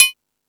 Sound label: Percussion, Drum Machines